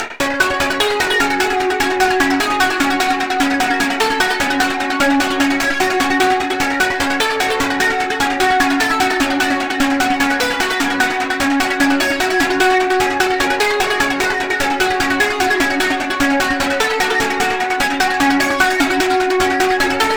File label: ps seq lead